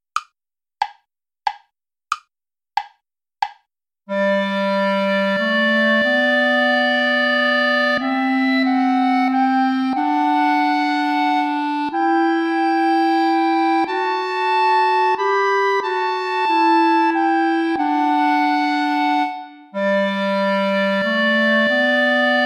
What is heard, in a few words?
sarabande play along without clarinet